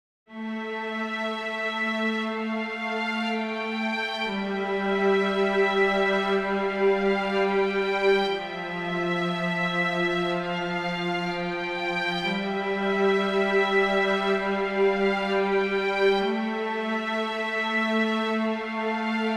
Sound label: Alone Violins